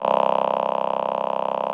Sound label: VP-330, String Machines